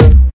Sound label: focused bass drum C key